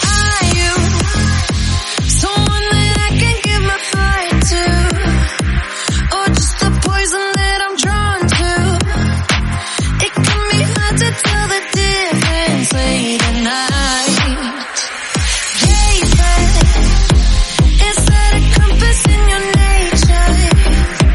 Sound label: 123 bpm Cm